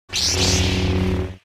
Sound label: Saber Hit